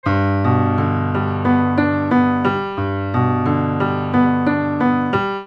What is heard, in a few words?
piano; xy; Cardioid / Condenser